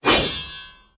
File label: swing; smite; god arthur